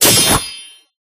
Sword; Life of a Naomi

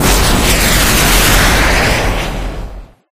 Launch; Life of a Naomi

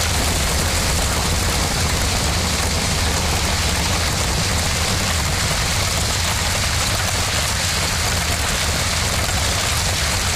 Fire; Life of a Naomi; bgs

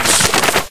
Book; Life of a Naomi

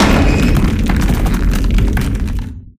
Gate; Life of a Naomi

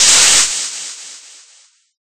Battle; Ruu Goblin Quest

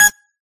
Cursor; life of a naomi; Naomi Carrigan

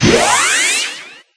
Barrier; life of a naomi; Naomi Carrigan

